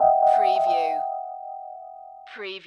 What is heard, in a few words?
Hip Hop Dark Rhodes Licks 90 BPM Swing - E